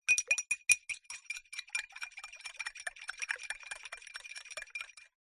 food drink metal spoon stir water in drinking glass